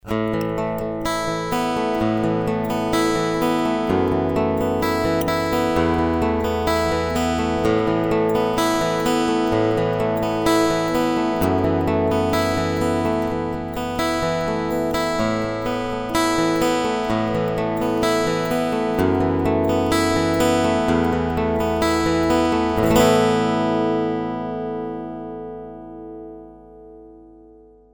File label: picking